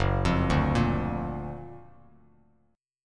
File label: Gb Augmented Major 7th Gb down Bass Standard; Gb+M7 arpeggiated